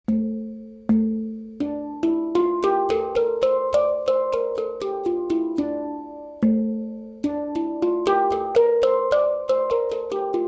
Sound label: Aeolian, hang